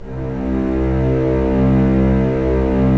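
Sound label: string section; Roland - LA Composer vol. 1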